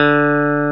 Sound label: DRY GUITAR; E-MU Formula 4000 Series Vol. 3; Analog Odyssey